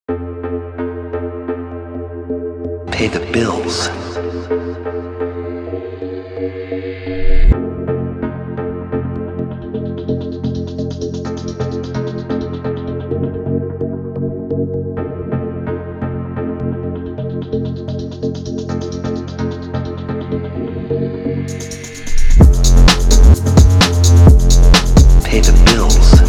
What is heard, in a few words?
Beat 129 BPM